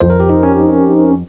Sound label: Present Lose; Mystery Tales: Time Travel; NDS